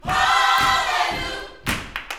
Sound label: Vocal, Gospel, PHRASE; Spectrasonics